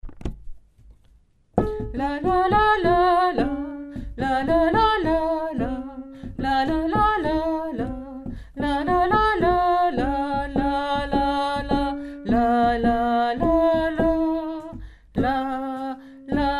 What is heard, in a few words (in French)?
chante hommes